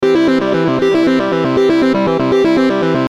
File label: Musical Synth, Musical Synth Descend Delay Loop; Synths; Blastwave